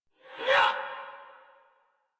Attack Effort; Warframe; Dax; Ranged

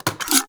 Fire Mech, Flame Thrower; Weapons; Warframe; Ignis Wraith

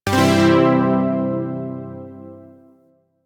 Shawzin Pent Maj Chord G; Chords; Warframe; Zariman

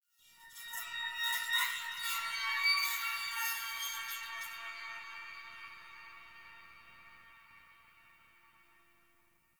Texture Interior Small, Ambience

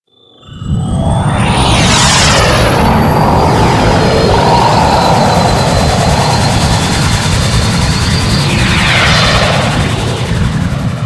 Hub Undocking; Liset; Ambience; Warframe

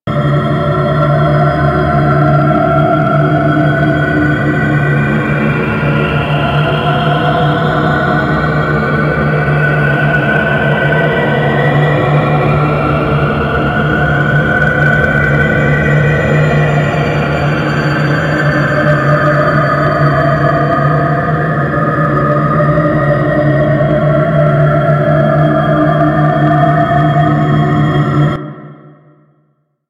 Space Trench Run Amb; Warframe

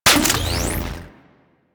Shotgun Mech; Crp Shotgun

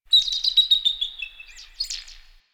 Ambient Bird Calls; Warframe; Duviri

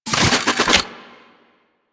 Fist Spin; Enemies; DE; Warframe; Tyl Regor

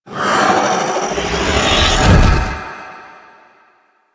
Eye Beam Charge; Orokin; Warframe; Ambience; Orokin Moon

